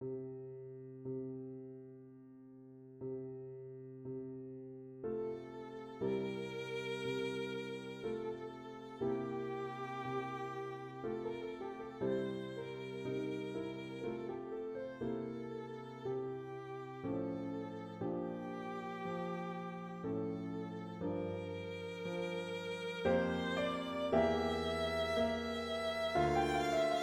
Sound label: carillon; bizet georges; arla sienne suite